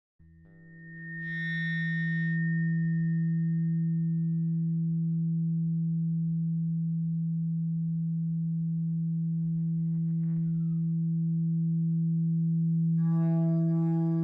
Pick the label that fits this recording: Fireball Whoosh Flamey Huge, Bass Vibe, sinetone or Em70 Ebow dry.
Em70 Ebow dry